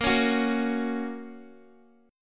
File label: Bb Minor Sharp 5th Bb down Guitar; Bbm#5 strummed